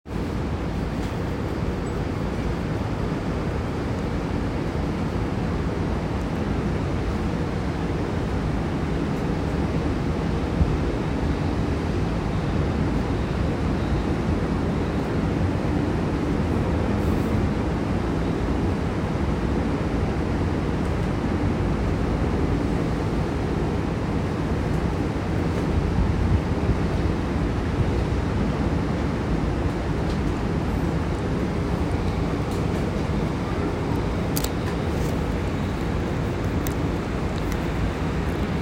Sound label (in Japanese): 西武池袋線飯能駅東, train sounds